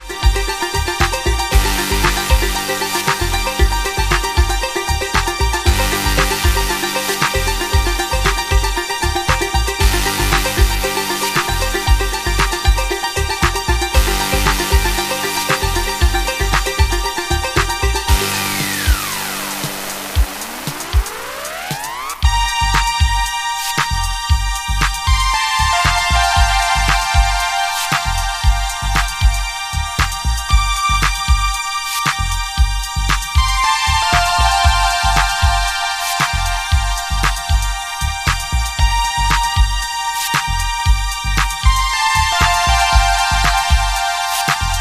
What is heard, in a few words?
electro